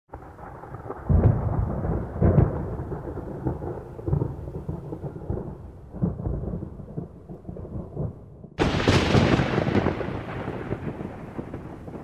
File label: Thunder Storm; SONIDOS TERROR